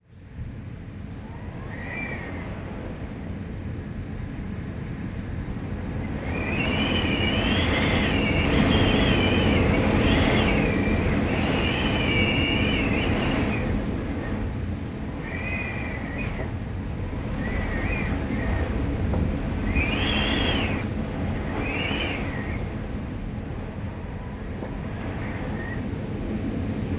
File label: Wind On Door Short